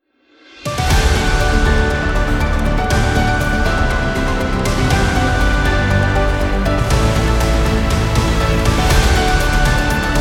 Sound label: Good Mood News Sport Political